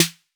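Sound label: 808 snare drum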